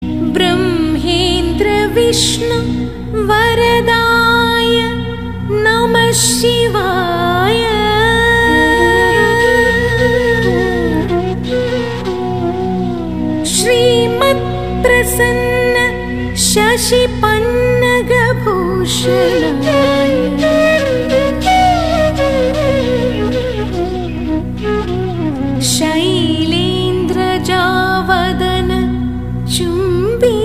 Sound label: Prayer Song Ringtone